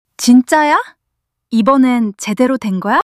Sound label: surprise